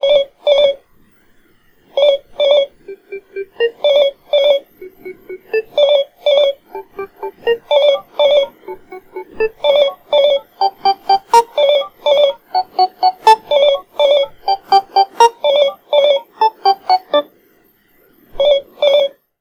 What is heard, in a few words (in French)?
sonnerie snom m3